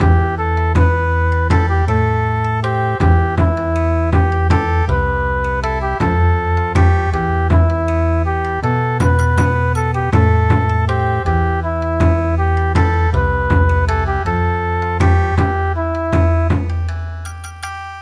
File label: Bon Odori, midi; Abu abu abua